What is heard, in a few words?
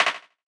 Clap